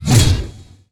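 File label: Sword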